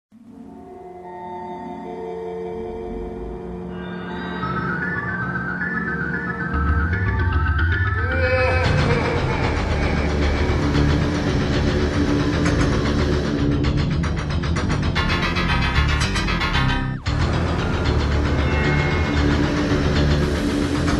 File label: laugh